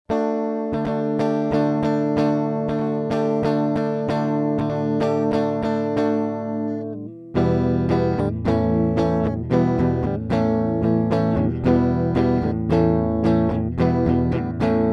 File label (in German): AMS Neve 1073 dpx Gitarre ohne EQ ohne HPF